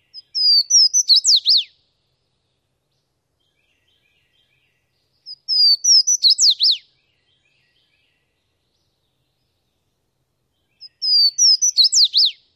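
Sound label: songs, Swainson's Warbler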